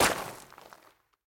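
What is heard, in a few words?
powerful single hit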